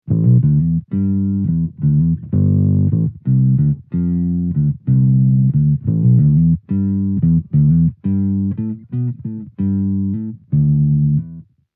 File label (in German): basslauf